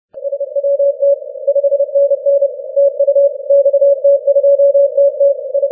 15m cw

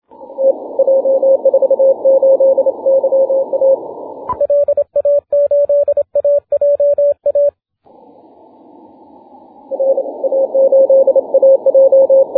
6m cw

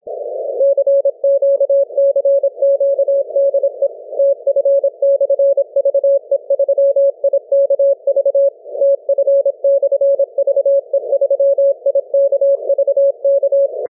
6m cw

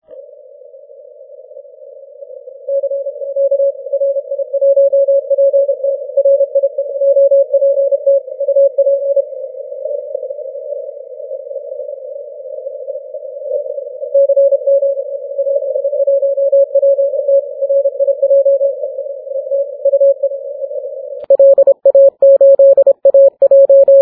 30m cw

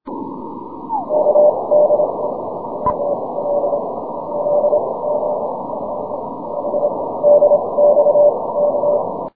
6m cw

